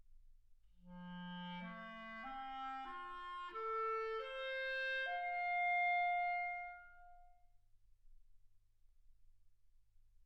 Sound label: clarinet